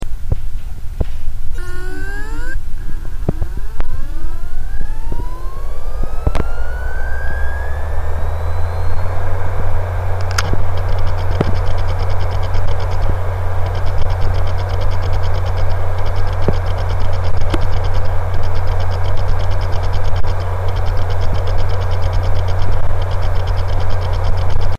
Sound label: Samsung one head dead; Почему у специалиста по восстановлению данных должен быть хороший слух?